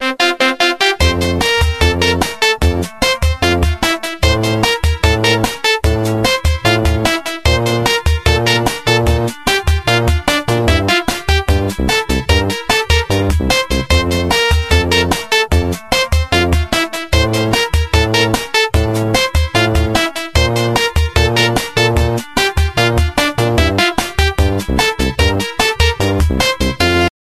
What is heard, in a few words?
huawei ringtones default